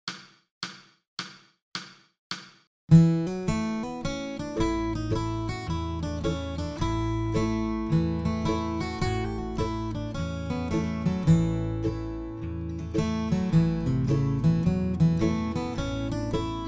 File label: Guitar Slow